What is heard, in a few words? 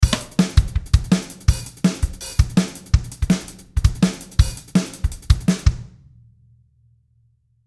socal kit